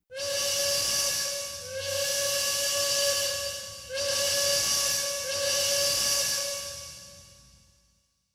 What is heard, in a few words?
Train Whistle